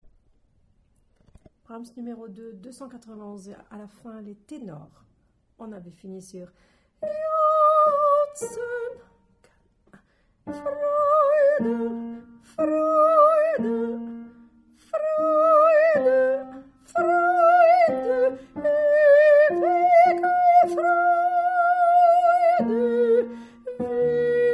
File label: fin Tenor